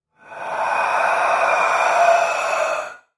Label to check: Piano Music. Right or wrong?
Wrong